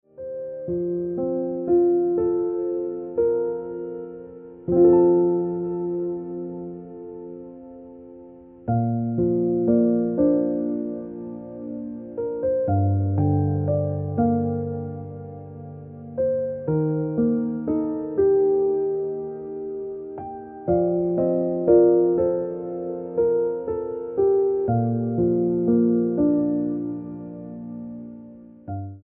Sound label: Piano Music